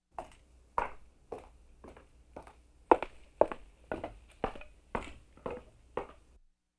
Urwald Auf Holz laufen vorbeilaufend

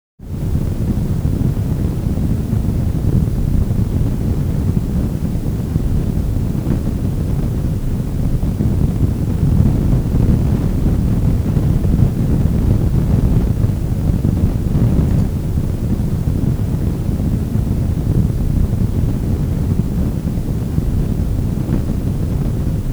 Feuer

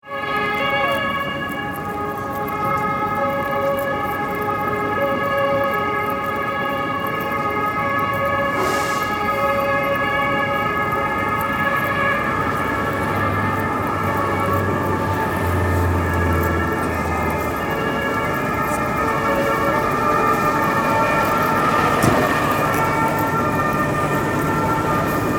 polizei weit weg

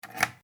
Ringordner Verschluss

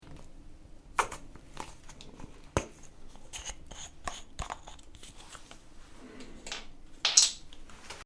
zaehne tube aufschrauben

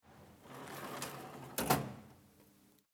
Schublade

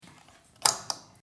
Stecker raus

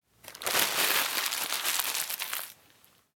Papiertuete zerknuellen

